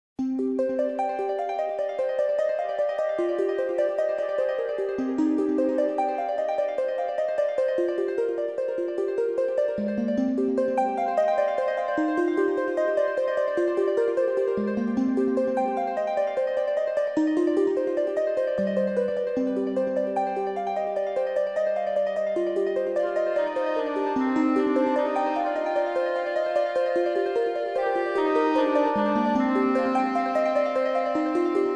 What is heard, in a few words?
atmosphere